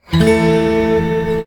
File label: bell